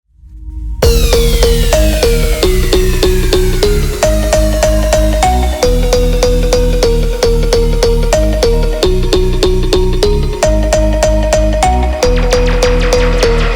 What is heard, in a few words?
Dubstep